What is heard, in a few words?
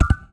snd ui error